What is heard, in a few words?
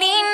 vocal